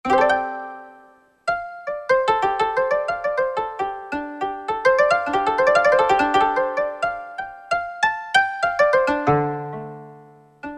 PL Koto, Yamaha TG77; Factory Presets

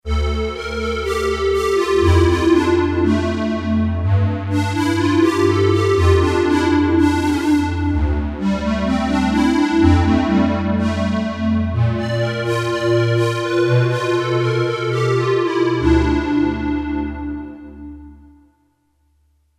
SQUARE PAD; Akai SG01v

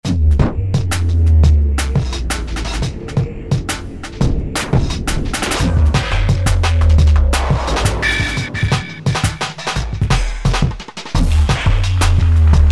Drum & Bass; Preset Styles

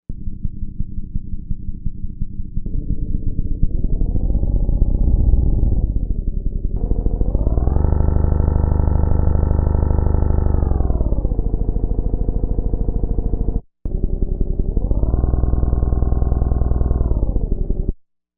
Off-World Loader, Novation UltraNova; Factory Patches

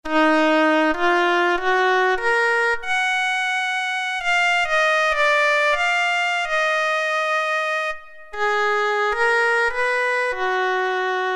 Oboe

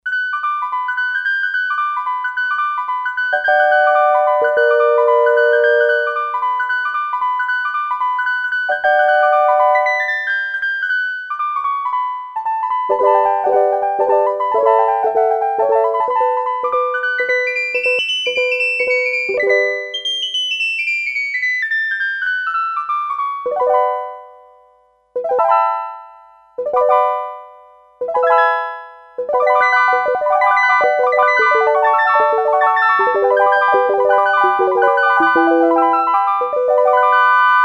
Echo, Sequential Circuits Six-Trak; Factory Programs

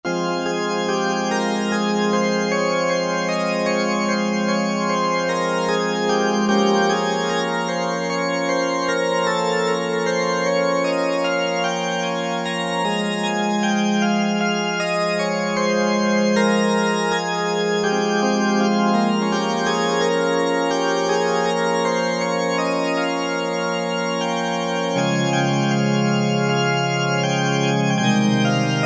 Pipe Organ, Korg MSC-9S Organ

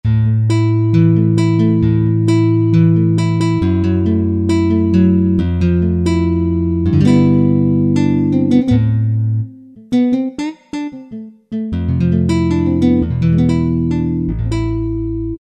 Thick Nylon, Alesis QS8; Factory Preset examples

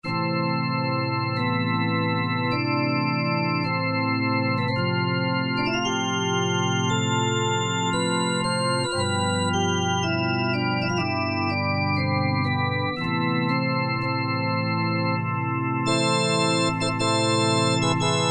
STEREO PIPE, Casio VZ-10M; VZ ROM Card

